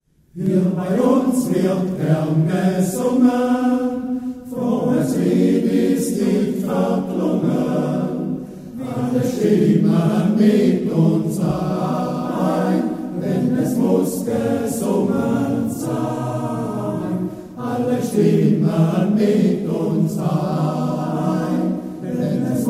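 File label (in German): Männerchor; MC Langrickenbach - Hier bei uns wird gern gesungen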